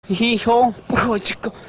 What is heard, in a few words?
hip hop